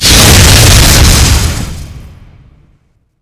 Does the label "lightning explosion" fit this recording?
Yes